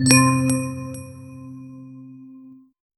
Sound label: warm reward reveal sound